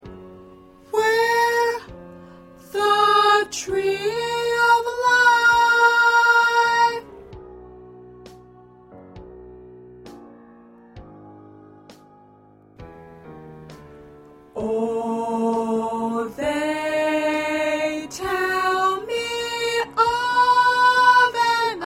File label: Alto; gospel songs; PRACTICE TRACKS